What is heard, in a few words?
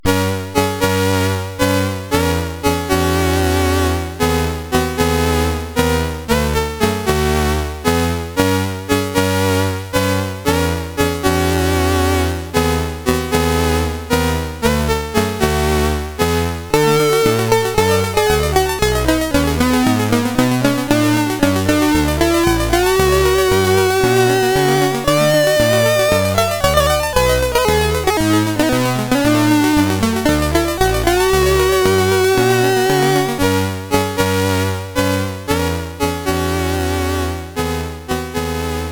BGM, commodore 64; Afterburner